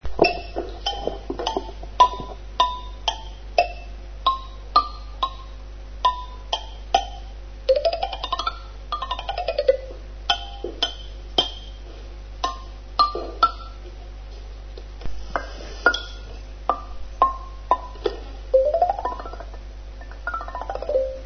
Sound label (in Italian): xilofono; CHE SUONO HANNO; e un gioco mentre suonano le campane?